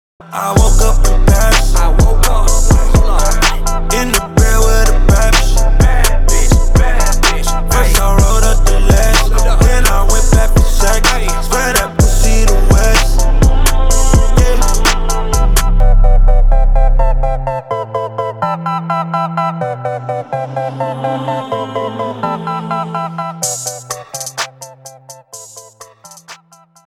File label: trap